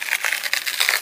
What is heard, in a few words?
cardboard box strain; Counter Strike Model Pack; CS:S